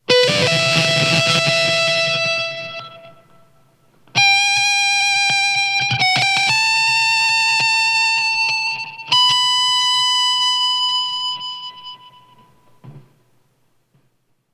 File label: shiver solo